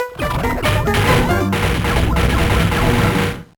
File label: Bathroom Jam